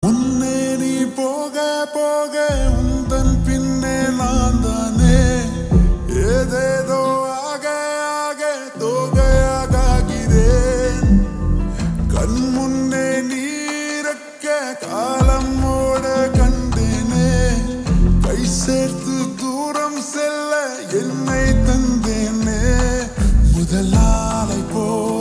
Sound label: Slowed Reverb; tamil